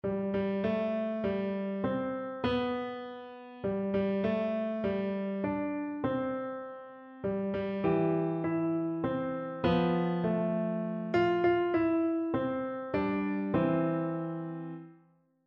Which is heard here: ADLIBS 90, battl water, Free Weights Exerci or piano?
piano